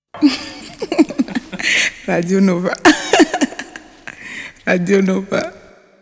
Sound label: Radio Nova Jingle; Nova 25 ans - 1983